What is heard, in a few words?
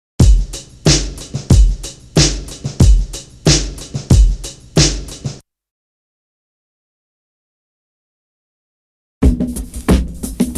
Drum loop; BEST OF DRUM BREAKS 30